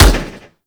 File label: Fire Shot, Weapons; Warframe; Burston